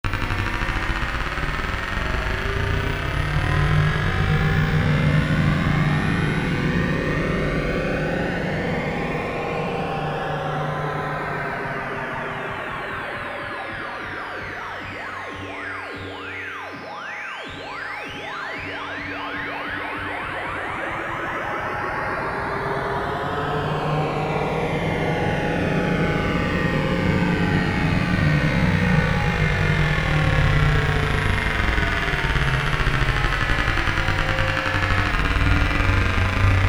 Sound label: Test Launch Rocket; Test Launch Ultra-Speed Rocket; Rocket Chaser